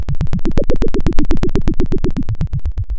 Sound of a helicopter

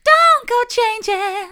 don't go changin soft, vocal

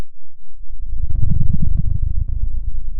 pop, Sound of a dinosaur

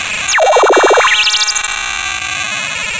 Sound of a cat meowing

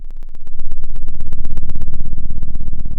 Sound of an engine revving

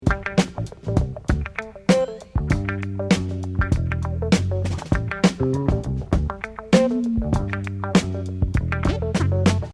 loop kicking mono

